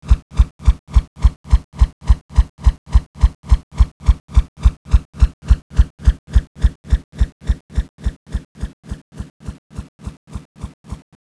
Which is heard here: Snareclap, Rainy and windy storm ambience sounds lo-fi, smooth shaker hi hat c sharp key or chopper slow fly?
chopper slow fly